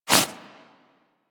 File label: quick foley; characters; generic foley; Warframe